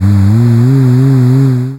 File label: cow